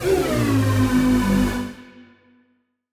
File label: Siren Horn down G, future rave, Siren-Horn Type Hits, Ramp Down